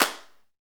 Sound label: PRC XCLAP, Hand Claps